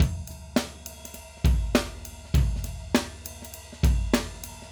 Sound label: Acoustic Live Drum; Platinum Audiolab